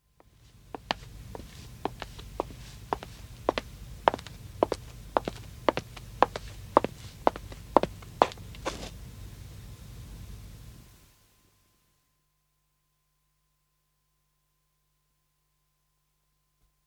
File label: Footstep wood walk, 1 woman approaches and stops; BBC